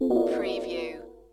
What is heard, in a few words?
Space Machine